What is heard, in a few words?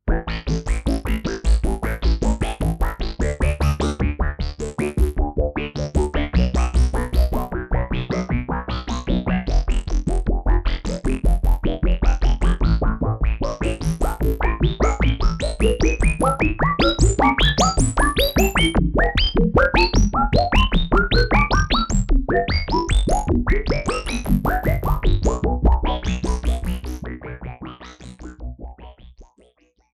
LFO Mod